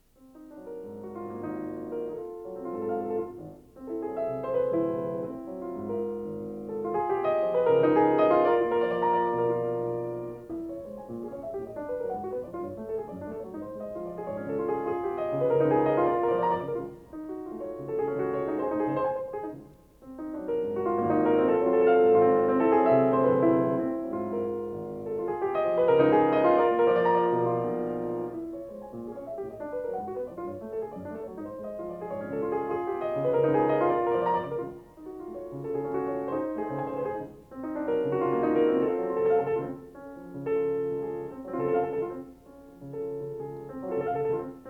Preludes for piano, No. 3 in D-Flat Major; Scriabin

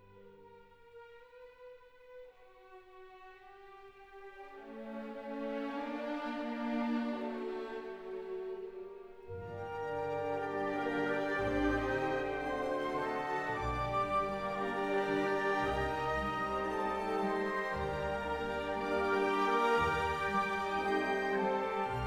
Enigma Variations, for orchestra, L'istesso tempo; Elgar

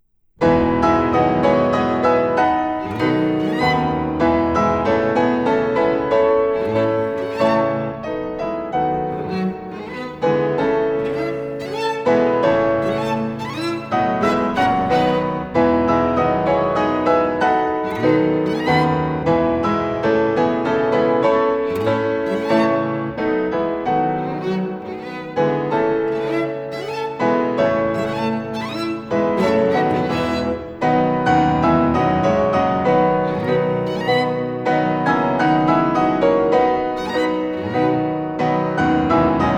Piano Trio in A minor: Andante con moto

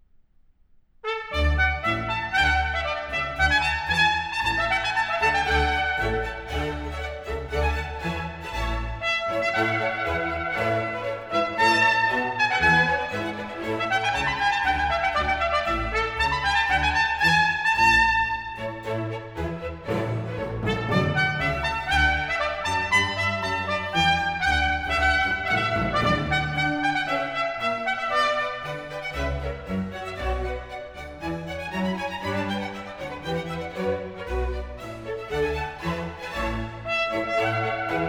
Sonata, for trumpet, strings & continuo, Allegro

